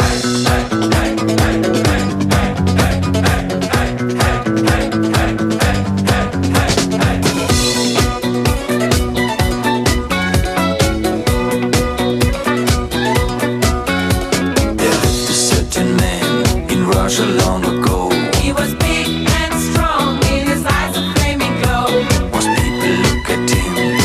music

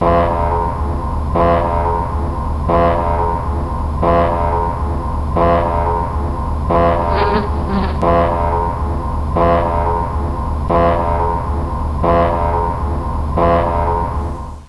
start alarm

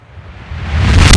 implosion, futuristic grenades

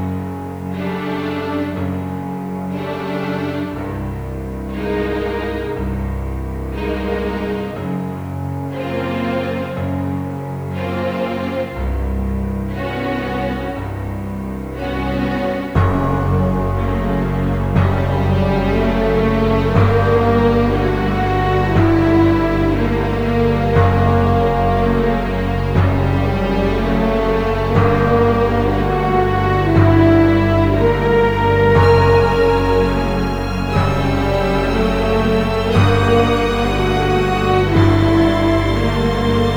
music; desert

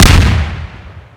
explosion, futuristic grenades

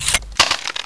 clipout; weapons; usp avatar

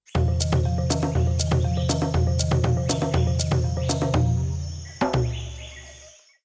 jungle drums

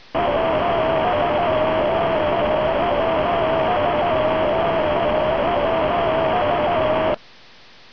jumpscare

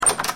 default locked, doors

